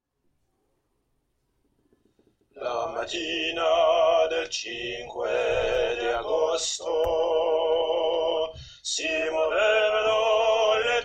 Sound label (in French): basse